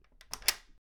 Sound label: Door Lock